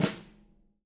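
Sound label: snare flam, JZ XSNARES; Sampleheads; Peter Erskine Living Drums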